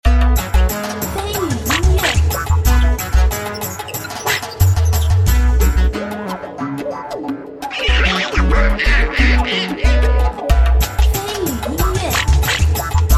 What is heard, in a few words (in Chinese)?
欢乐-律动-活力